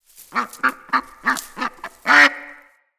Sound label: Duck Idle Chatter; Encounters; Animal Rescue